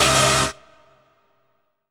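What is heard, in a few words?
Rave Synth, Dance Mega Jungle Rave; Best Service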